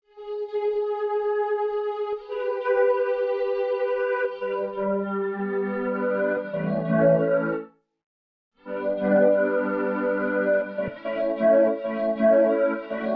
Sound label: chords, 113BPM; Pony Fan Music Remix Stems